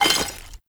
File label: Vendor Clay Shuffle Texture; Ambience; Soul Plane